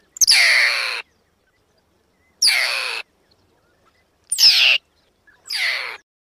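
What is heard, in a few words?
mangust, mongoose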